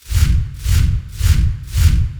swoosh

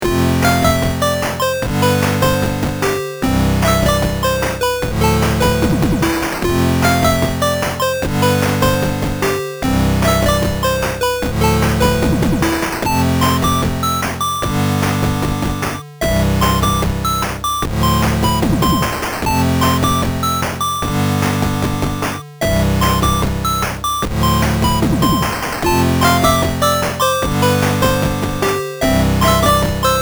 town music

